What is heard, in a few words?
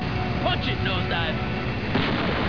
Punch; "Punch it, Nosedive!"; Wildwing